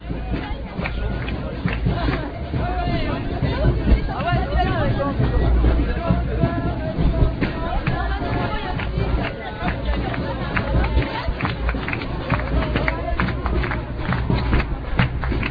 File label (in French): ambiance